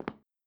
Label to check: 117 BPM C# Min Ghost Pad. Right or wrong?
Wrong